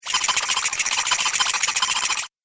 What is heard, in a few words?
Frantic Droid Noises; Robot Sound Effects; Science Fiction Sound Effect; Sci-Fi Sfx; Andrew Fleming